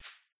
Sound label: board highlight